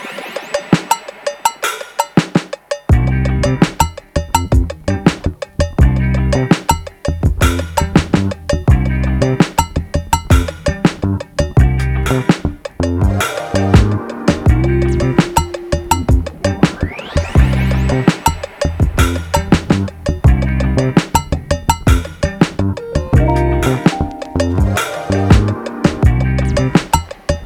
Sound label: raw loop; rawkitchen sink sample pack